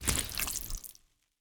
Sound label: Jellyfish Squish